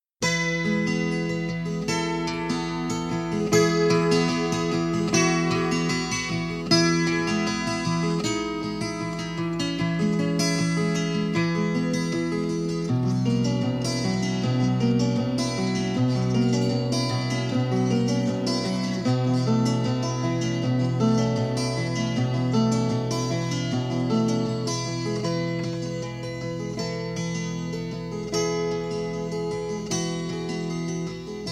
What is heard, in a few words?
Twelve String